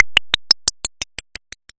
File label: transform; moondef